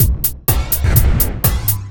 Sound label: Processed Beat, Drums & Percussion